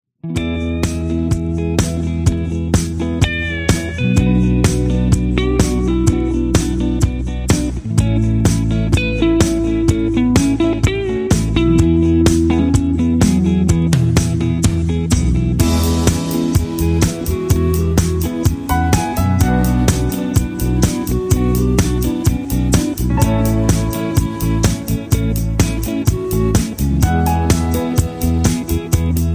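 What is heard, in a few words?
Instrumental with Leads No Solo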